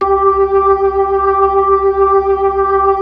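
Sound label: B-3, club chorus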